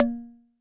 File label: E-Perc Block, Electronic Percussion; Drums; Ableton Live 12 Lite; Core Library